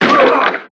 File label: Sfx American football